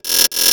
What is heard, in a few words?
Buzz Fill, Drums & Percussion; Percussion Fills; FX; Sonic Foundry (Sony Creative Software); Futurist Drum & Bass